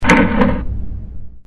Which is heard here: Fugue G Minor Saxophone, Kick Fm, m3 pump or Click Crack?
Click Crack